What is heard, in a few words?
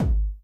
BD 99 Dist